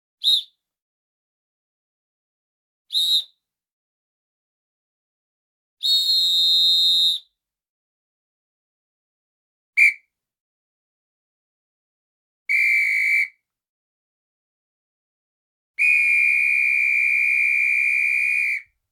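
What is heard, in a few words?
Whistle Fx Short Blow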